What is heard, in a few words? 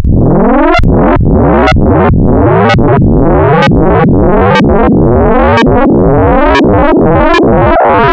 FM - 2 Exp, wavetables, Frequency Modulation; Architecture 32